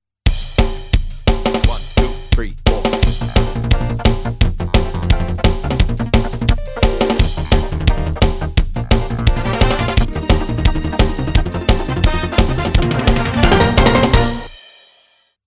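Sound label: tongue test Bb @172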